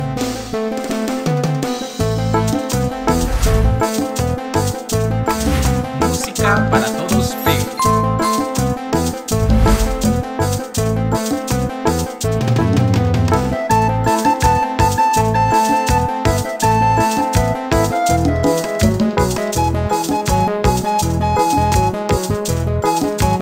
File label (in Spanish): FLAUTA dulce